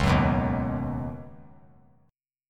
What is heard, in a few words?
Db 7th Suspended 2nd Suspended 4th; JGuitar